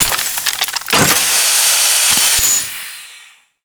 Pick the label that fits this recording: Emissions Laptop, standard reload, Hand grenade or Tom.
standard reload